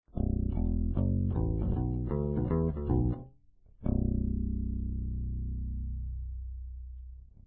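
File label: neck pickup pick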